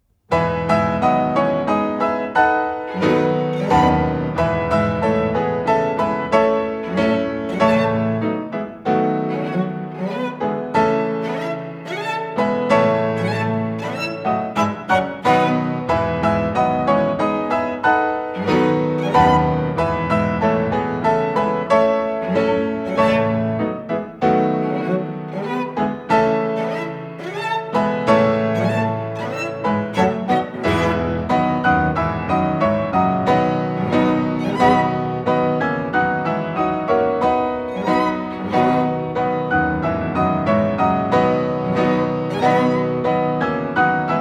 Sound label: Piano Trio in A Minor, Variation